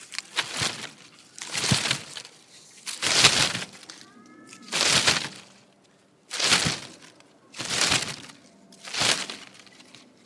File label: Shaking Branch